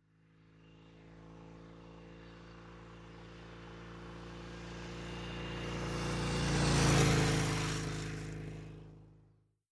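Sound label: Motorcycle Norton Accelerate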